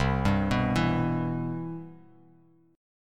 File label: C Major 7th, Bass Standard; CM7 arpeggiated; JGuitar